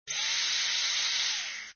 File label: slide